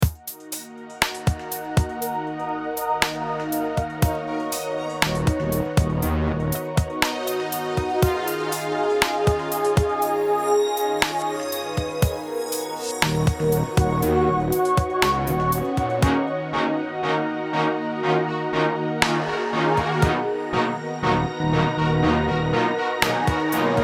Beats A Mechanical Heart

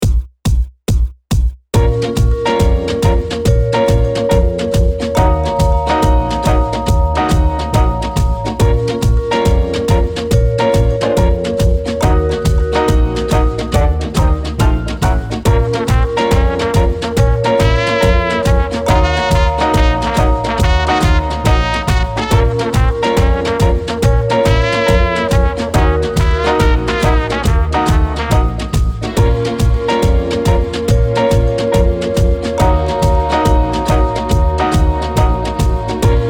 More Beat; M-TRON MKII

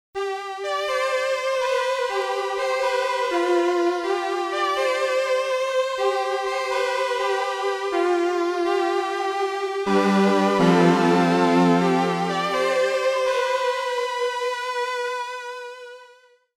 SS30 Violins

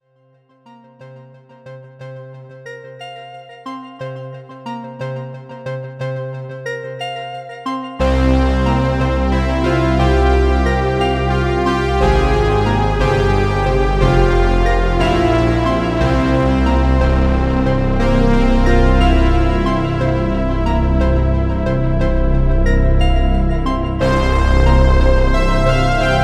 Sequence Split Shuffle

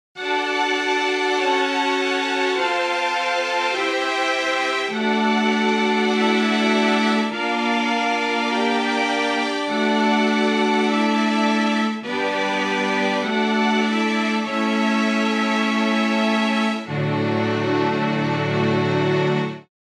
Big Strings

